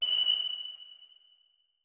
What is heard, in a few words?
notes, keyboard; Hideout Furniture